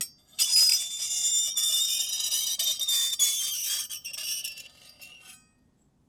Unpleasant Scraping, Kitchen Knives; Micro Pack

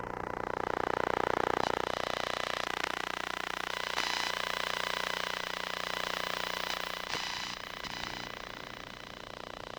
Computer Monitor, Electromagnetic Sounds; Micro Pack; NazdyNate

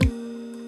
Snap, Drums; BB 2HTC Samples Vol 4

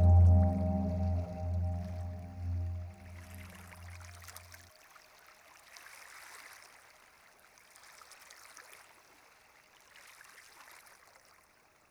Pads to Water; BB 2HTC Samples Vol 4; Weird

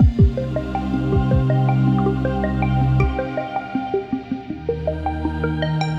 Chilled Arp; BB 2HTC Samples Vol 4

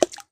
splash; water splash slime sfx

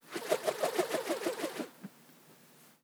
Twirl, organic wooshes; Micro Pack

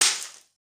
glass breaking; breaking falling hit sfx

